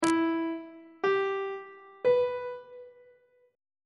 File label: b harmonic minor chord 4 is e minor on treble clef